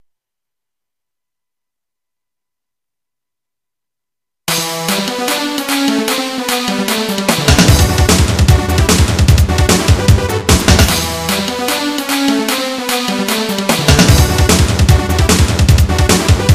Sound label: rock